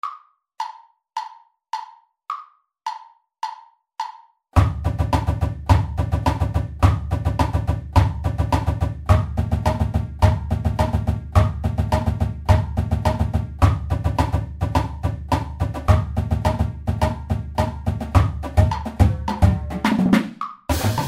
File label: flams click